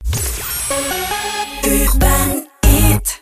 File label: jingle; urbanhit